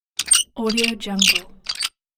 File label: faucet squeak